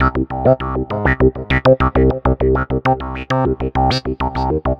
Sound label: VA Synthesis, Keyboards; Sonic Foundry (Sony Creative Software); Leo Cavallo Bass Taster